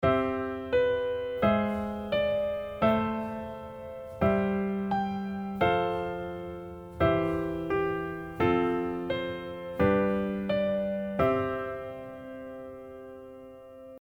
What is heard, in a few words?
diatonic